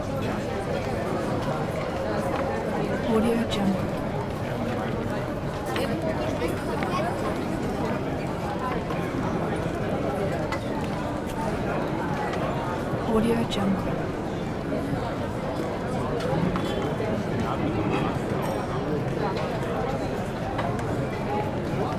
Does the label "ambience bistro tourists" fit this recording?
Yes